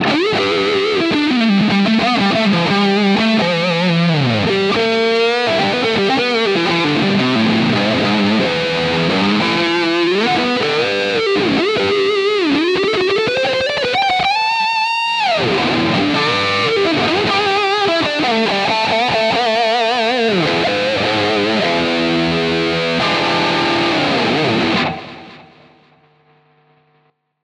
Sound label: Squier Highgain Lead